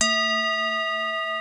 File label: TIBETAN, TIBETAN BELLS; Crypton - DrumBase5000